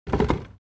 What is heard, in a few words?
Light furniture set down on the floor